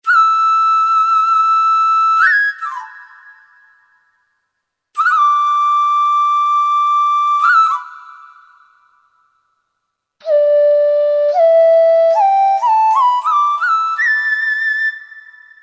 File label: Orient Wind; Kurzweil K2000 V3